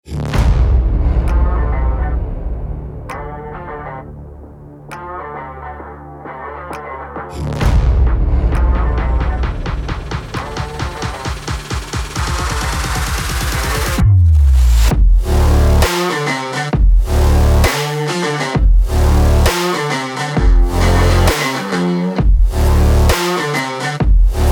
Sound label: stylish rock beat trailer